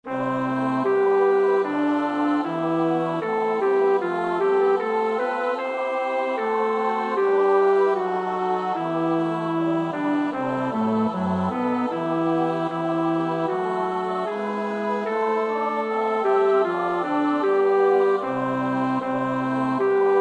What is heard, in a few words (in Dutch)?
bas, koorpartij oefening; Bach (Johann Sebastiaan); BWV 149